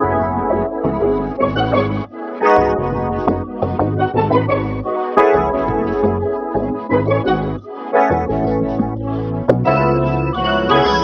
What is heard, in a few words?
keys carribean flava, Phonky loops